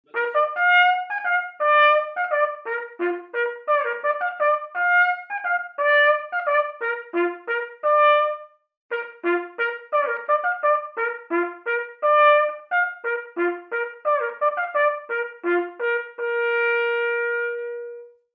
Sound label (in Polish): pobudka myśliwska